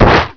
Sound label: hit